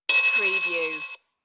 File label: Toy Bell